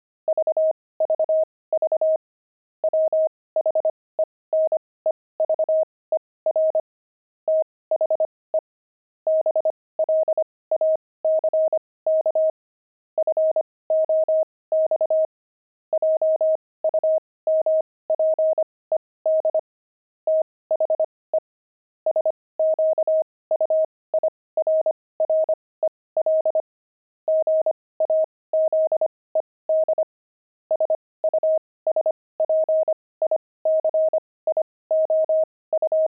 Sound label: pangram, intermediate pangrams files